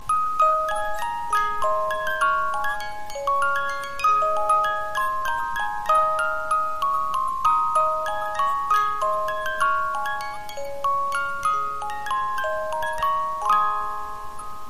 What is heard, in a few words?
Home On The Range, Music Box